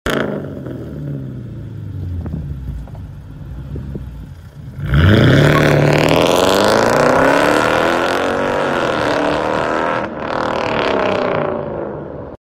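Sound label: Benz cls500, exhaust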